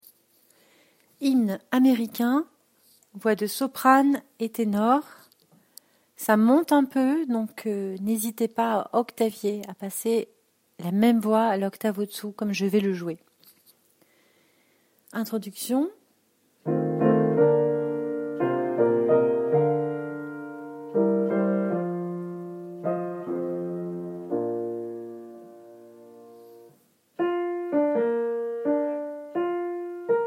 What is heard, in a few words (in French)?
hymne des etats unis sop tenors